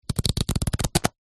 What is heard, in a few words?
leisure playing cards deck flick through